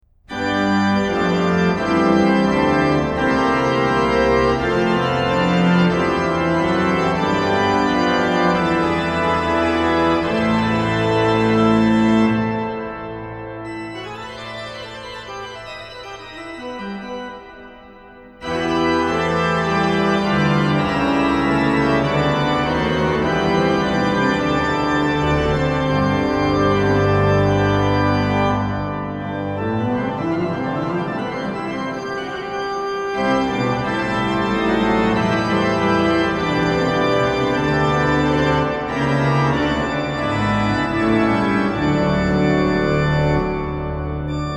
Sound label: Gelobet seist du, Jesu Christ, organ; BWV0722; BACH; BACH ORGAN WORKS; CHORALE PRELUDES